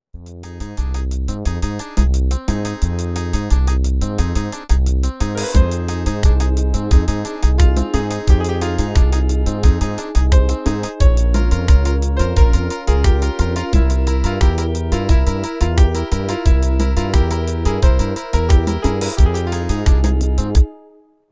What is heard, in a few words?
Polyphony sample